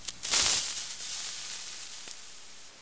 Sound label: fuse